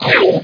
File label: sound force defense; MOTS